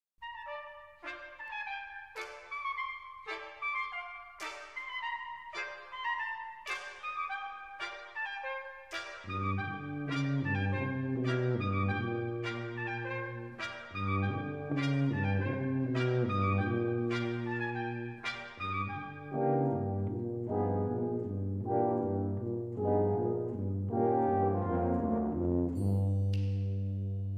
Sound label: brass